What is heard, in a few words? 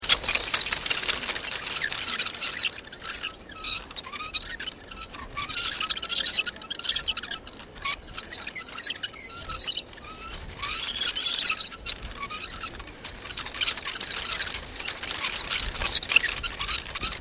PINWHEEL SINGLE LOOP, A childs pinwheel at Mt Doom in Japan (Mount Osoresan; Miscellaneous Toy